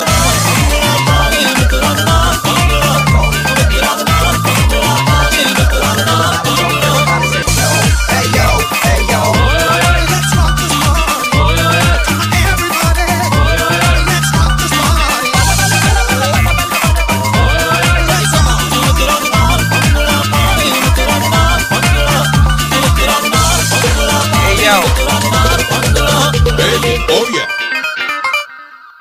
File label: bangra dance